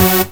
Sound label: Synths F#; Vengeance Samples